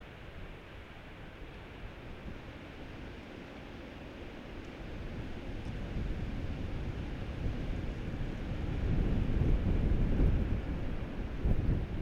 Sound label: yamanakako sound